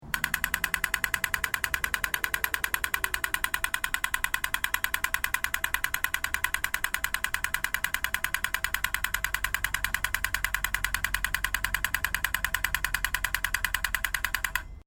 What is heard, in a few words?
PEDESTRIAN CROSSING ACTIVE STEREO, Pedestrian crossing signal; Transportation Sound Effects; Transportation (Sound Effects:Backgrounds)